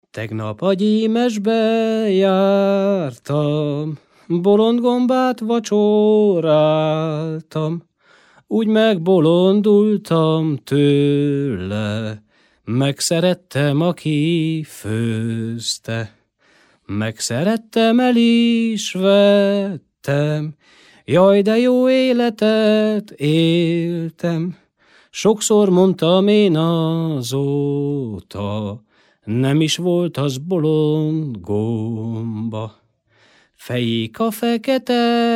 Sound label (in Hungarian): nepdal; tegnap a gyimesbe jartam; nepdalok